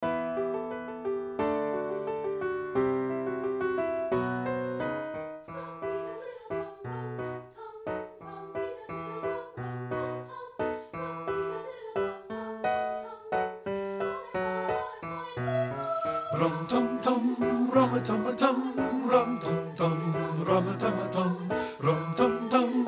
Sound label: BASS; satb